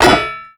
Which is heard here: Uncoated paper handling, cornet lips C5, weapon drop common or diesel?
weapon drop common